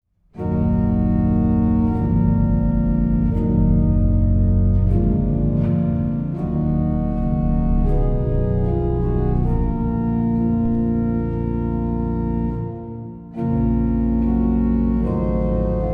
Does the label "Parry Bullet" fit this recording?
No